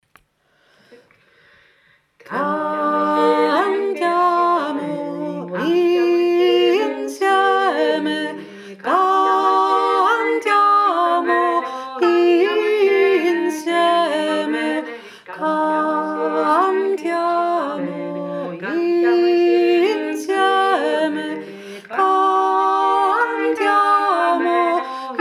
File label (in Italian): cantiamo 3 voce